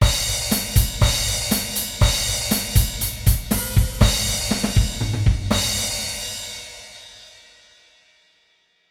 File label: melo fill